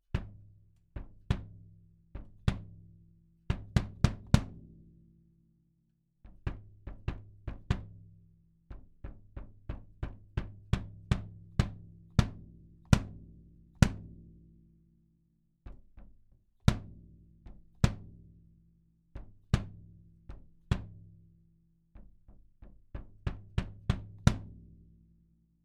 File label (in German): DPA 4055 Bass Drum Aufnahme ca. 30 cm